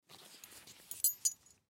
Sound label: Belt Leather Pick, Belt Leather Pick Up; Belt Leather Pick Up Carpet; Pick Ups; Foley